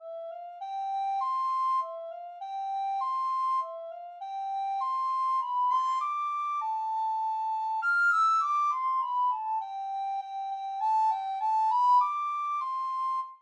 dona blanca, recorder

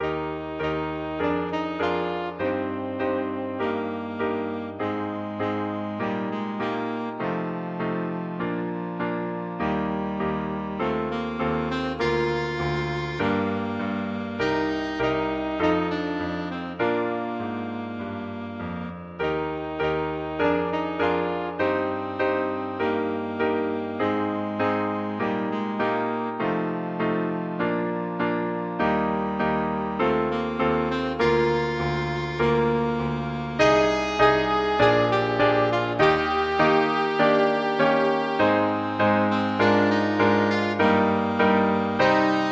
elgar land of hope, saxophone

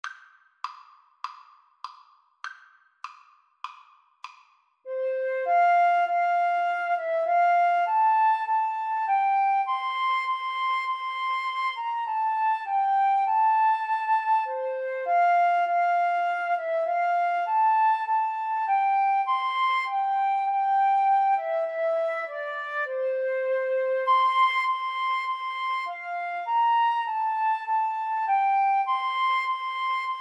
Flute Clarinet Duet; hark the herald

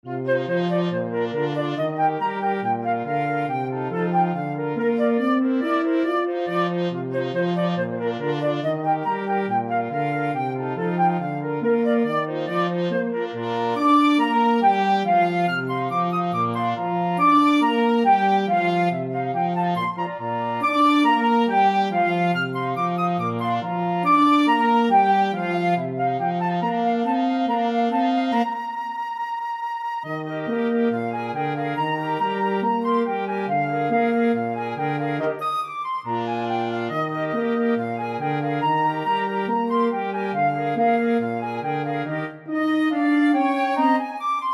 flexible mixed ensemble, 4 players

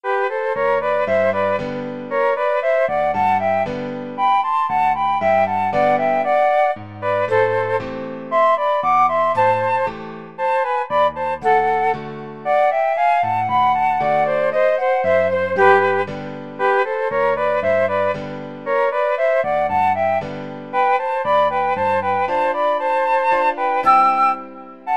por, flute duet

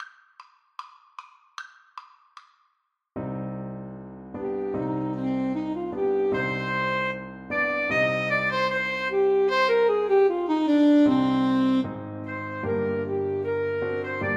Alto Saxophone Duet

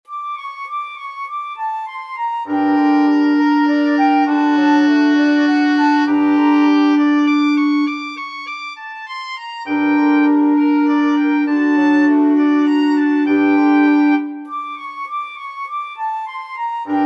wind quartet; beethoven fur elise

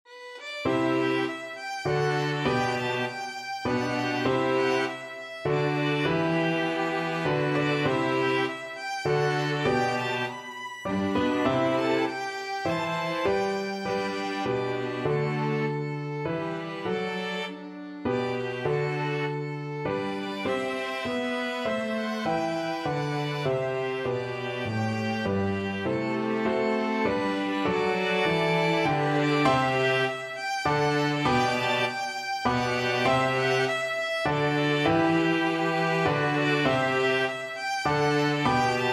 piano quintet; holst jupiter

